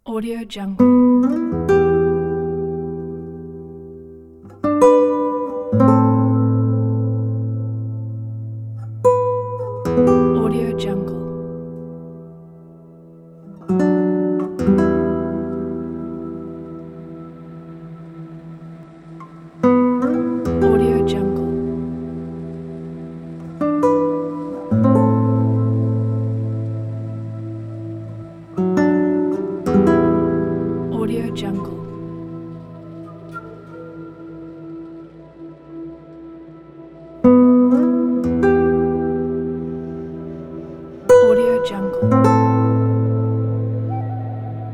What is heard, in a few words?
nylon guitar ambient